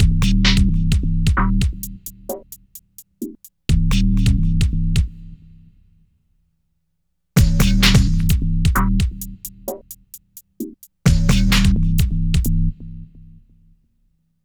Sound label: Hardcore Breakbeat, 130 Bbmin; Big Fish Audio; Hardcore Breakbeat Action